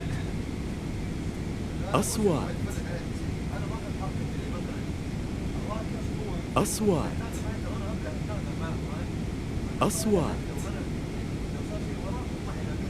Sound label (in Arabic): صوت محيط الطائرة; airplane ambience